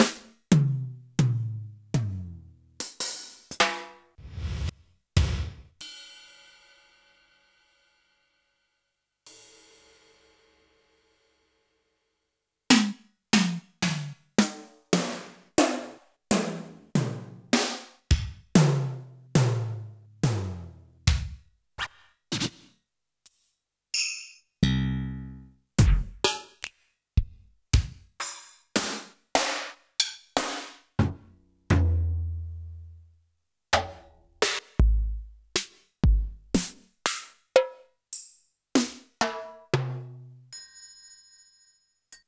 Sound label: Roland R-70